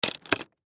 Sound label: Hatchet Drop Rock, Hatchet Drop Rocks Axe; Axes (Sound Effects:Tools); Blastwave